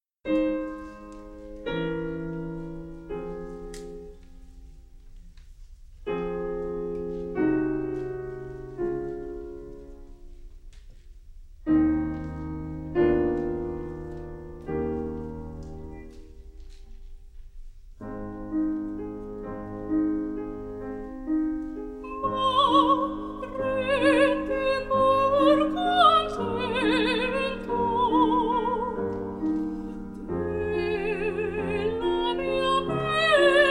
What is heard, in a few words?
soprano recital; Liriche / Niccolò van Westerhout